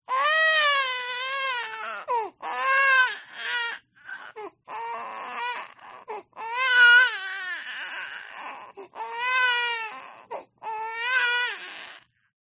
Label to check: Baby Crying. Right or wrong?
Right